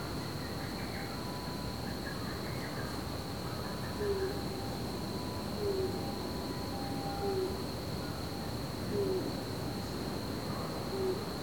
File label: Japanese Night Heron